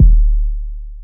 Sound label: Kick; Street Anthems Sound Kit